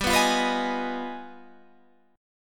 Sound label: G Augmented 7th Flat 9th